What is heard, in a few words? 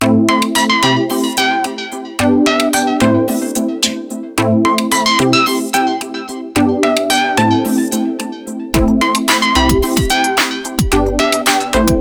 stock tone; oppo f7